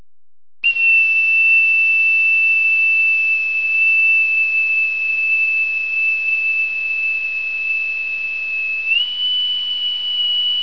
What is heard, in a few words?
Mess Call, bosun